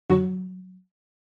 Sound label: OS Sound Notification